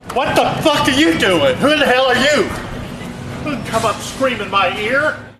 screaming in peoples ear

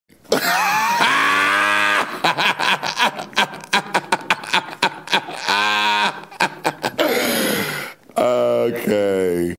laughing; latrice royale

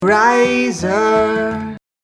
riser

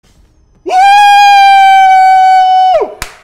woooooooooooo

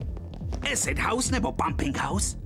pumping house

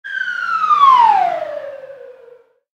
cartoon falling whistle sound effects

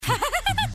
nervous laugh

